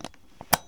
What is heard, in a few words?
Plane P40 Seat Belt Unbuckle; Airplanes